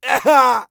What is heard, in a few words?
death; Escape from Tarkov